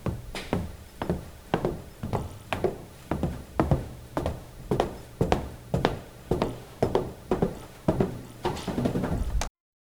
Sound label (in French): bruit de pas au plafond; hallway